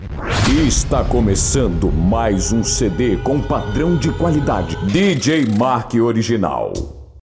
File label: dance reggae